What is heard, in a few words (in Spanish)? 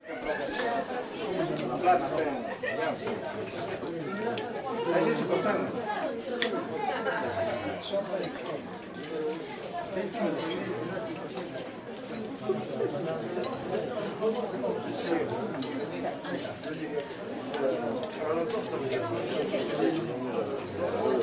AMBIENTE INTERIOR FIESTA EN HUANCAYO SIN MÚSICA GENTE CONVERSA Y ALMUERZA